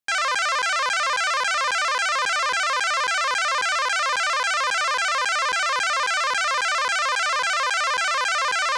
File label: Tractor Beam Shot; Galaga